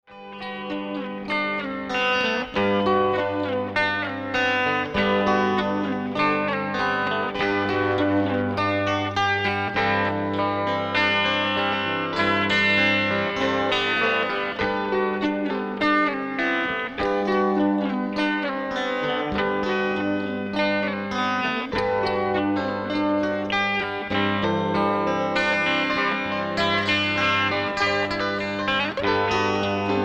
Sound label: Electric, Open G